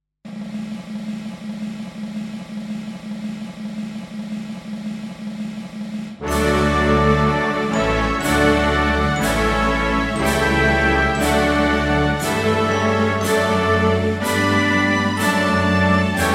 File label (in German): Deutschlandlied, instrumental